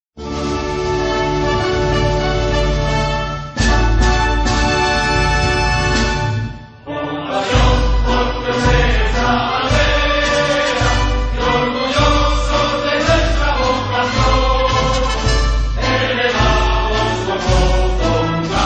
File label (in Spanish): Himno; AGBS